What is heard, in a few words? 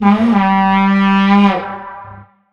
Vuvezela